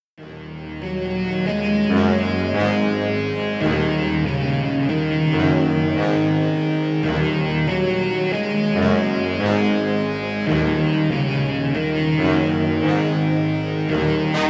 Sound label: Brass Melody; ambition brass melodies